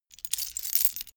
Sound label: key ring large pick one; objekte; schluessel